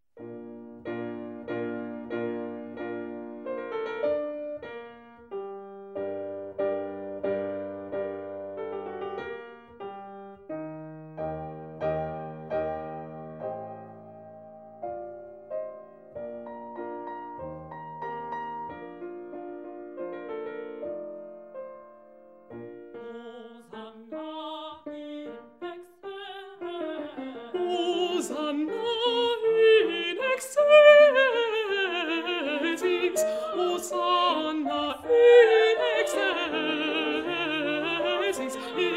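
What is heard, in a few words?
alto; benedictus; schola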